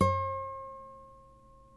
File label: NYL NV D, nylon no vib guitars; Spectrasonics; Hans Zimmer Guitars VOL-1